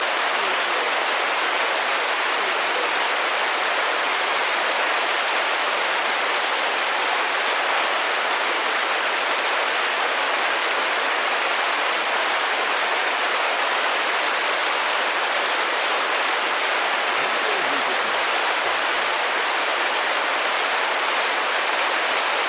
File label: Rain Indoor Window